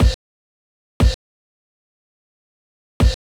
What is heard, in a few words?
kick; hydrogen